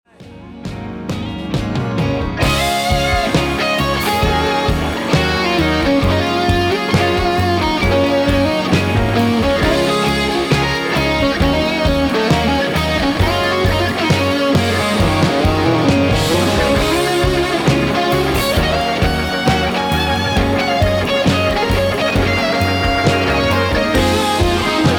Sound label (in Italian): assolo, guitar